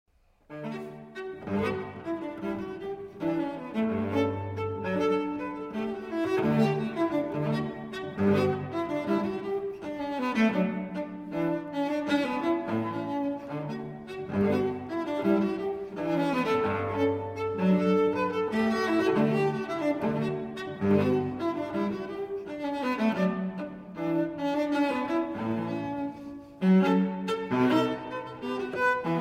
Unaccompanied Cello Suite No. 6 in D Major Gavottes I & II; BWV 1012